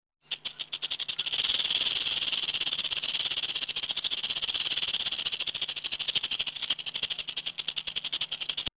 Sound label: rattlesnake